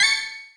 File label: System Accent